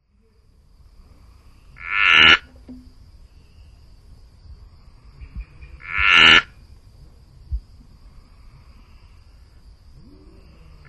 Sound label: Aglyptodactylus securifer